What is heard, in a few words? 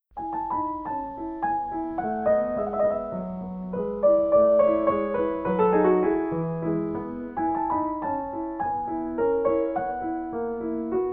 French Suite E Major Polonaise; J.S.Bach; BWV 817